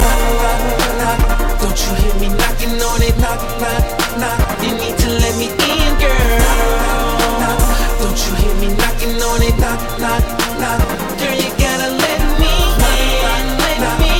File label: Knock Knock